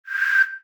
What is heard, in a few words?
HAPTIC DIVIDER CREATE, Sharp AQUOS phone ui; AQUOS sense lite SH-M05